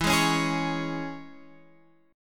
C sharp Minor E, chord

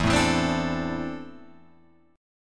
E 7th Suspended 4th Sharp 5th, Guitar, Open F; E7sus4#5 strummed; JGuitar

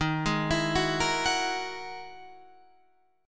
E Major Sharp 11th D sharp

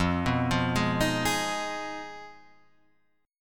F Minor 7th Flat 5th F down Guitar Half Step Down; Fm7b5

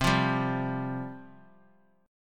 C Suspended 4th C; Csus4 strummed; JGuitar

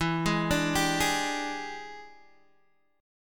E 7th Sharp 9th Guitar Open D, chord

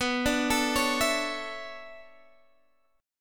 B Minor 11th B down, Bm11 chord; arpeggiated

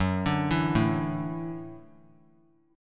F sharp Minor 7th Sharp 5th F sharp; arpeggiated; JGuitar

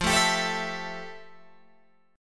F Major 9th F, Guitar, Open G

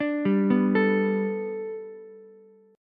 G Major Add 9th Ukulele C6, chord; Gadd9 arpeggiated

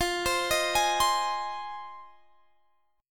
G sharp 6th F, chord

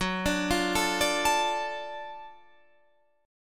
F sharp Minor Major 7th Sharp 5th F sharp down

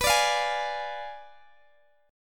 B Diminished 7th B Guitar Open D

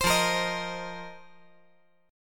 F 7th Suspended 4th F down

